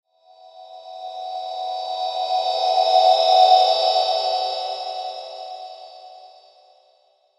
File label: SHIMMER RING; Shimmer Ring Low; High Tone